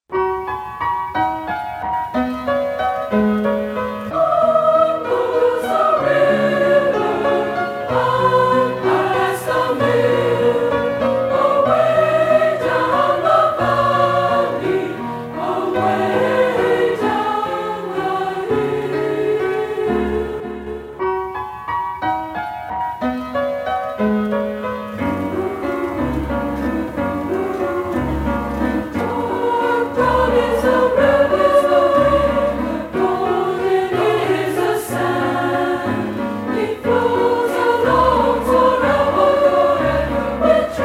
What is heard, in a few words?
Women's Chorale